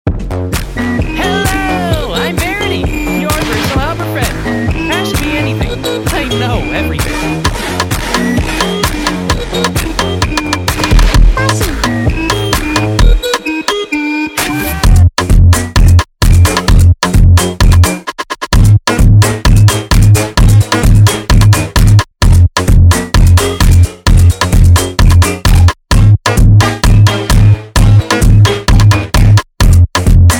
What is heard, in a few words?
phonk